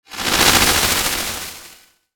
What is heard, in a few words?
Sci-fi Transition